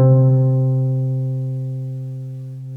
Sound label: HARP C2